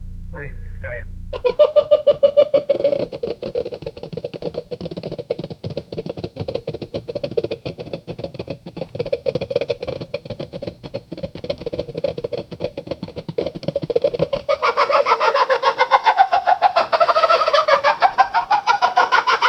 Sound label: in a big house kitchen, clip-clop